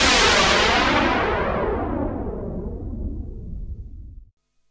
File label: Juno-60, Filtered Noise